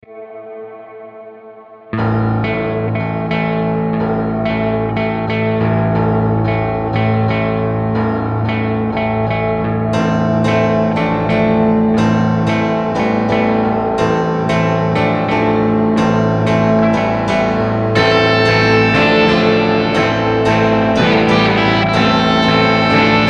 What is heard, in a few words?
Epiphone